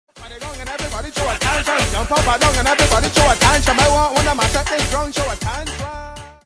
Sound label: riddim